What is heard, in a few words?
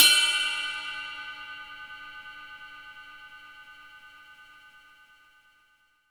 Ride Bell